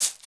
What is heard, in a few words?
Shaker; Equipped Music; Loungin House